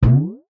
cartoon bounce drum like rising tail; zapsplat

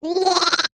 cartoon animal lamb sheep baa

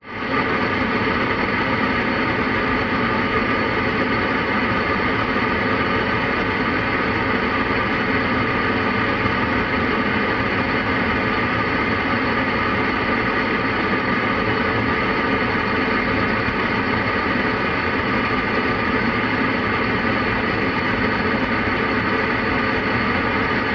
transport car radio static internal ambience

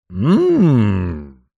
human male say hmmm as in interesting

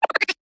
cartoon laugh mouse high pitched

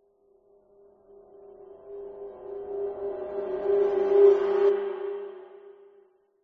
horror riser metal reversed rattle

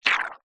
cartoon short wet squelch

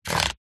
leisure game score slider move slide

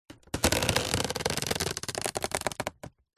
household basket wicker creak movement

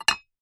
household tin shoe polish set down wood

